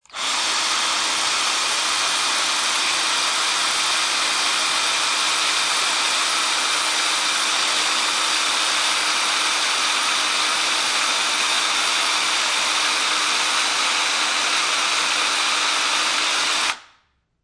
Drill Electric Drill Hole In Wood, Industry, Drill, Electric Drill Drilling Hole Into Wood